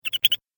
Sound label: Arcade Game Chirpy Beeps; Video Game Sound Effects; Retro Inspired Sound Effects - Think Nintendo, Atari, C64, Etc.; Andrew Fleming